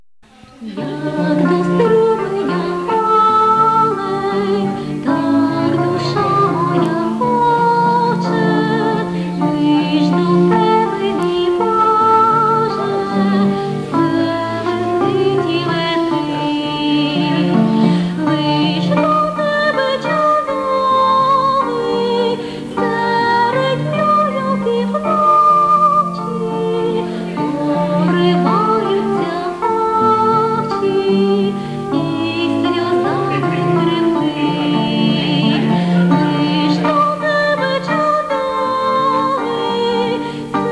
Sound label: female solo